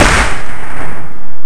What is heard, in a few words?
Clap; Guitar Rock Tour